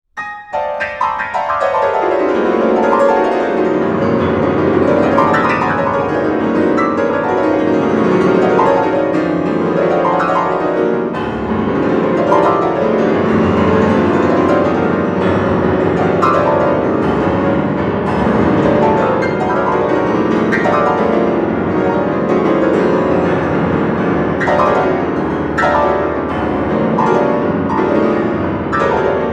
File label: piano triptych